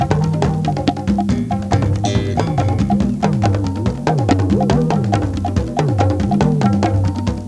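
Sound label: rhythm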